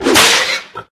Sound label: sling fire